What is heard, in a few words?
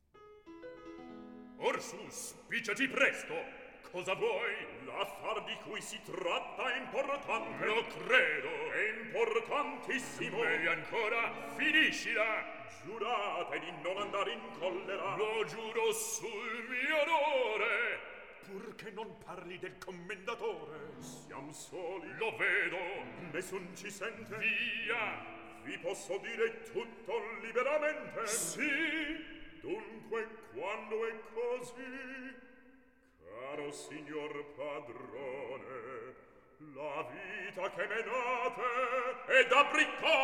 Recitativo; Mozart Lirica